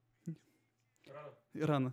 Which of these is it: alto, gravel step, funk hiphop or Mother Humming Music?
alto